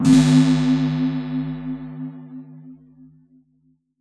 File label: VZWD WINDS